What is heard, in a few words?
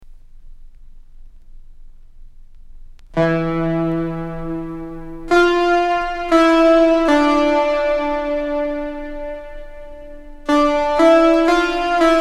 dramatic solo tune